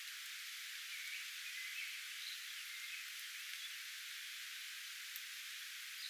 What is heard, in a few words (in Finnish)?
oranssipaakerttu ehka